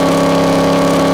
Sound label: HIGH, Engine; Cars; KING